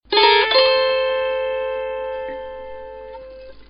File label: Hammering On, autoharp